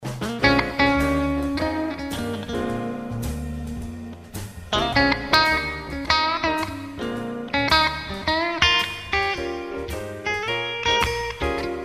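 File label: twin clean blues clean, guitar pickups; P90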